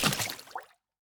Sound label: Footstep Water; Opsive; UltimateCharacterController